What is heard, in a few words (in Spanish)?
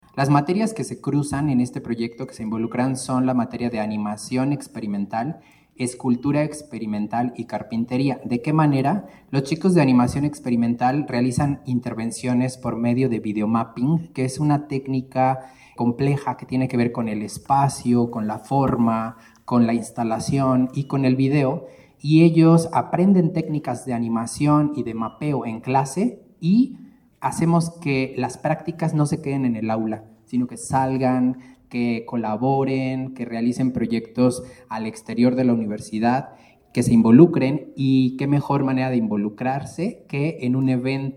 Arpa